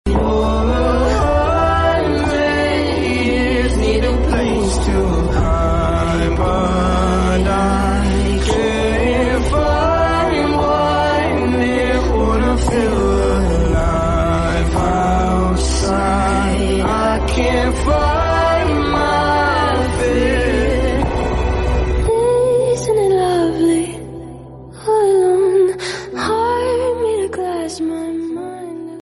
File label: heart touching english sing tone ringtone